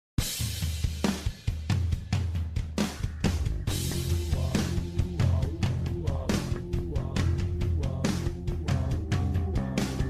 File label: cartoon ringtone; underdog